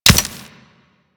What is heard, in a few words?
Grenade Hit Dirt; Warframe; Grineer Ghouls; Ghoul Expired; Attacks